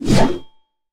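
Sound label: commanding deft strike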